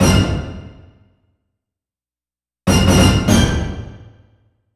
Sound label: Orchestral Punch; Sonic Foundry (Sony Creative Software); Dr. Fink's Funk Factory; Orchestral Hits